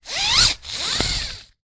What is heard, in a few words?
dog leash lead small extendable retractable short pull then retract; animals